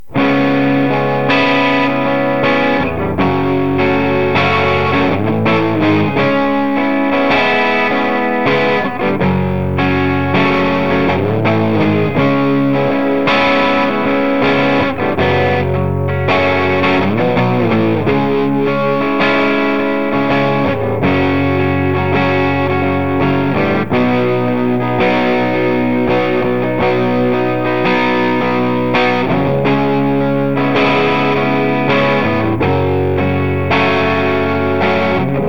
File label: AC30 USB